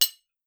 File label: metal small; Phasmophobia